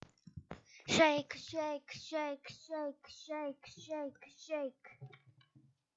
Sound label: shake